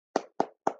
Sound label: lobby sound